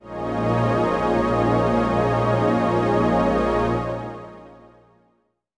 automated pad dry

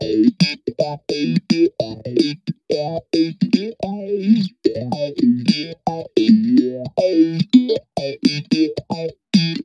guit tubey

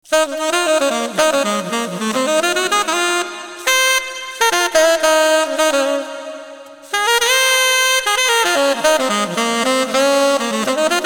solo sax wet variations

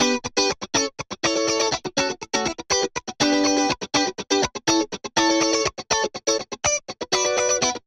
guit dry

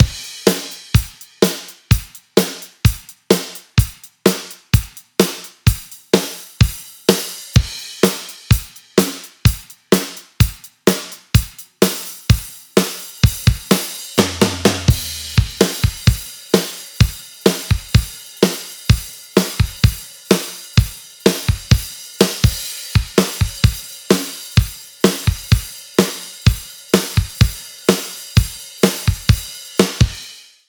T-drums comp on single tracks master

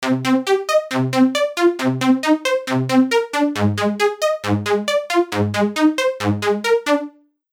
seq mono dry

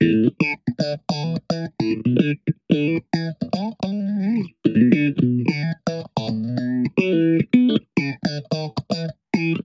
guit adv stereo

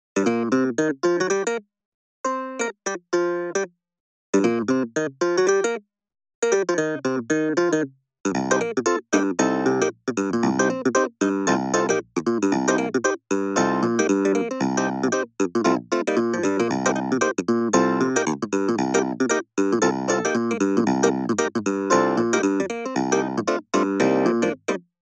clav dry

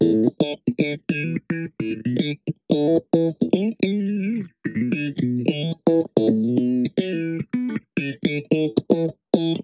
guit reso

